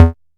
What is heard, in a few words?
bass stabs, Drum Machines FutureRetro FR777